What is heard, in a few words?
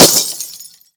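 destruction fluorescent light bulb; Explosions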